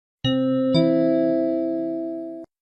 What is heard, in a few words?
gong